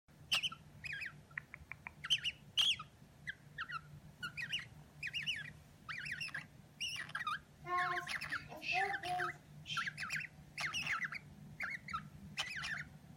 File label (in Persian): صدا و آواز خواندن عروس هلندی ماده